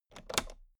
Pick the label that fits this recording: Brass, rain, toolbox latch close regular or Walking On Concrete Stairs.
toolbox latch close regular